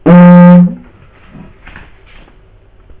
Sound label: didgeridoo toot